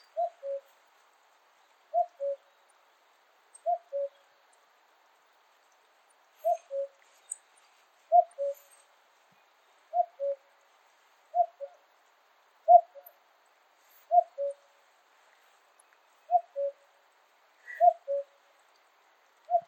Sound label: Cuculus canorus, Pallars Sobirà; Estaron; cda vallsdaneu